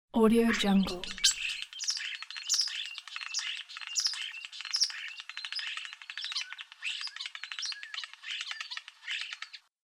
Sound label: starling singing